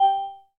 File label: Class Harp, notifications